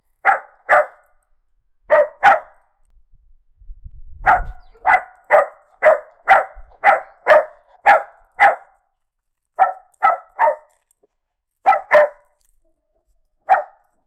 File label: barking sound of dog; animal communication